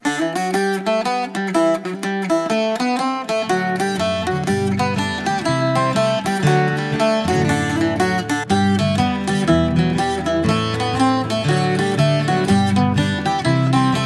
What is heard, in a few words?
hornpipe